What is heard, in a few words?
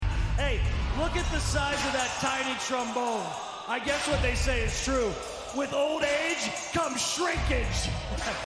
trombone; Xavier